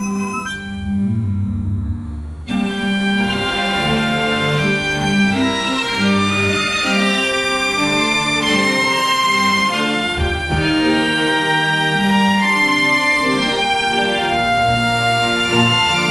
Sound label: classic eritrea music; world music